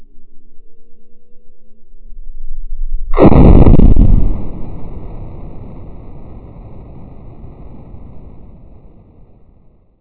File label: explo